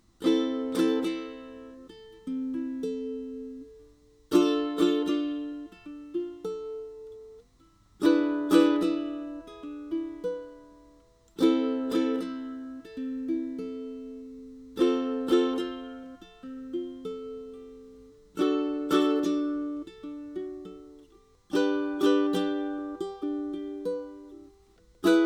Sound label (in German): akkordfolge, ukulele